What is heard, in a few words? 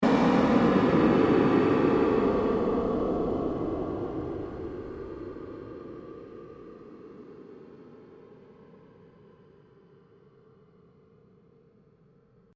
pulse fade long, Various sweeps, pulses and drones; Rise And Dive; Rises and Dives